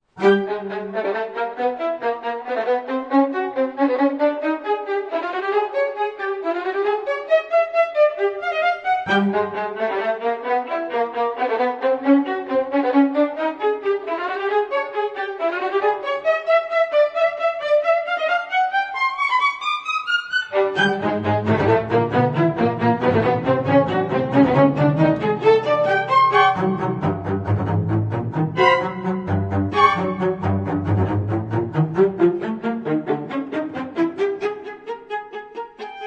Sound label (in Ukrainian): klasik melodiya